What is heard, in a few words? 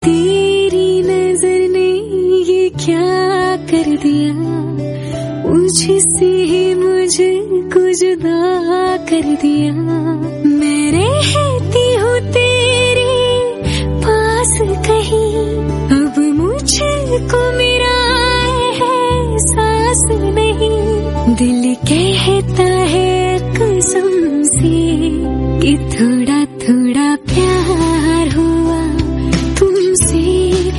Female, ringtone